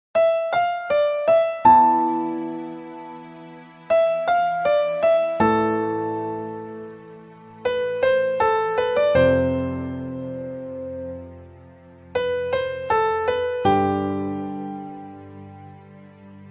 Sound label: sad music tamil